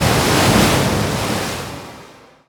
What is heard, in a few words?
riptide; weapons; dota; naga siren